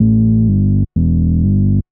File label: SNTH BASS HOUSE 125 A; eJay